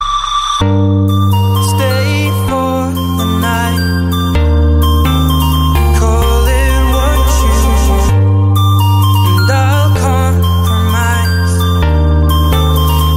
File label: with vocals